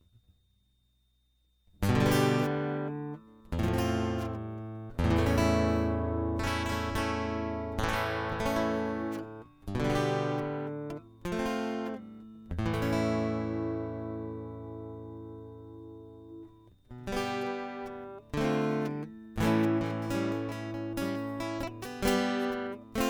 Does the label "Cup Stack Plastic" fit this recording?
No